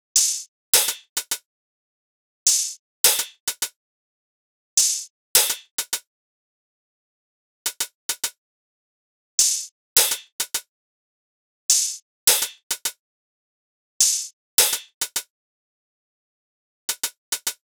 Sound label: Drum Loop - 104 BPM Hihat; Midtempo; Blossom; Cymatics; ANALOG EVOLUTION Beta